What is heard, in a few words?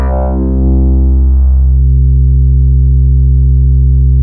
P6X Syn C3, Fairlight CMI IIe Keyboards; Keyboards2